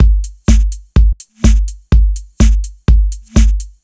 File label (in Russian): сэмплы ударных дэнс электро; Revenge